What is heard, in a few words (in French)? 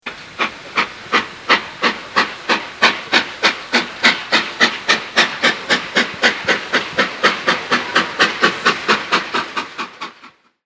Train vapeur